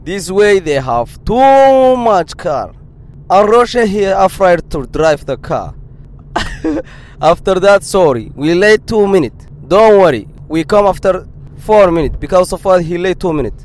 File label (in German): Autofahrt